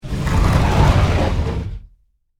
Secret Passage Way, Horror, Spin, Wood, Door, Open; Scary Soundscape; BlastWave